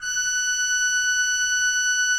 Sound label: F5, Cathedral Organ; ILIO; Propeller Islands